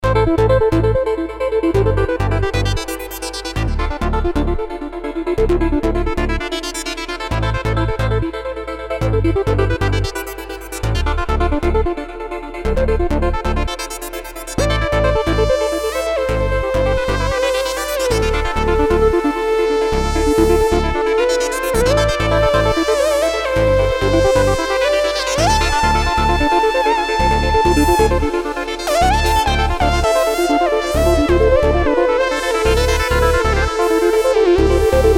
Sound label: Korg Arp 2600 VST Theme